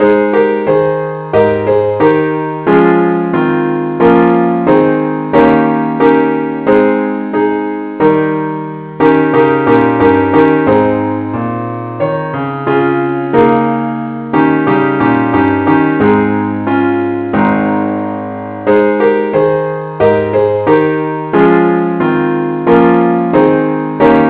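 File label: keyboard Only key a flat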